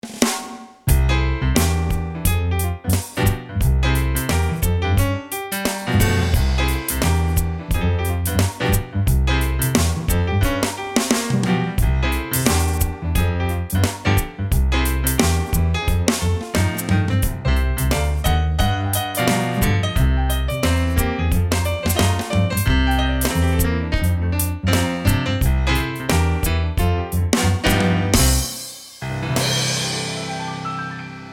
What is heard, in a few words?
Piano Break